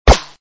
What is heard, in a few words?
silenced; Gun Mayhem 2